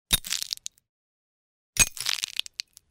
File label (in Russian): zvuk camera